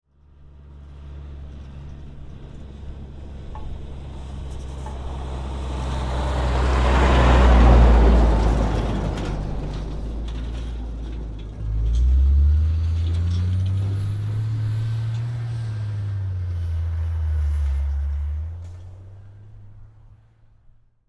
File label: Repair Truck, Telephone, Pass, By, Medium; Truck Sound Effects; BlastWave